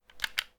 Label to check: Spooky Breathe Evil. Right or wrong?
Wrong